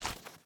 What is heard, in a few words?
step